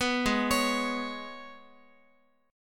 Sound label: G sharp Minor Double Flat 5th G sharp, Guitar; G#mbb5; arpeggiated; JGuitar